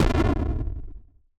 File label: explosion ship